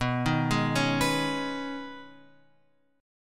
B 6th Add 9th B down Guitar Open F; B6add9; arpeggiated

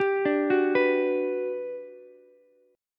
G Major 7th, Ukulele; arpeggiated; JGuitar

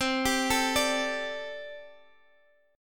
G Suspended 2nd Suspended 4th C

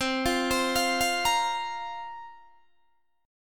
C 7th Suspended 4th, Guitar, DADGAD

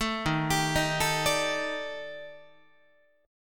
Eb Major 7th Flat 5th, Guitar; EbM7b5; arpeggiated; JGuitar

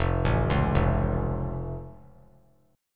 F Augmented F; arpeggiated

